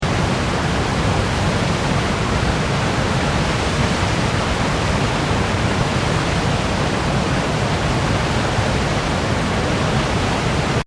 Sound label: Water Dam Overflow Heavy; Elemental weather effects; Nature